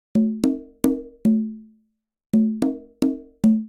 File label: Guaguanco Conga Tumba 220bpm, Latin Percussion; Guaguanco 1; Ableton; Factory Packs